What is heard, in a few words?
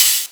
Cymbals HH Open; Vengeance Samples